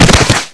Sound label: body fall cement